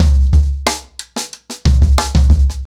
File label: 90BPM, ACOUSTIC DRUMS